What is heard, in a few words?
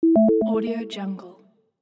alert